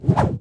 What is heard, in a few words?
Throw